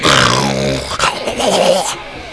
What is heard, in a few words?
fast zombie pain